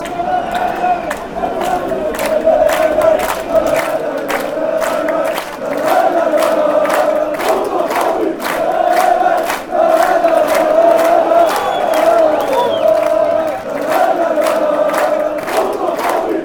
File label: trabzonspor chant